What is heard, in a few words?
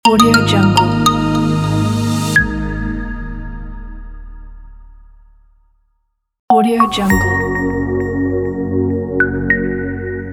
marketing logo pack